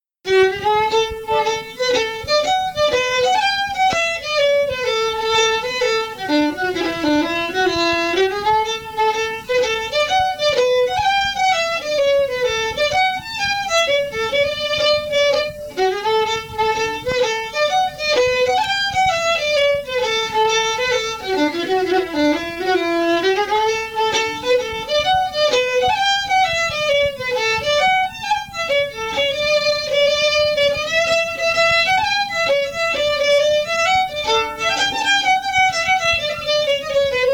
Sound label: fiddle; SeamusGrantFestival; tune tutor